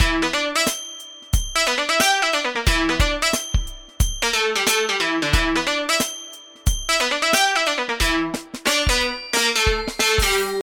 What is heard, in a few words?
funky apple tone ringtone